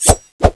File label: knife deploy; ninja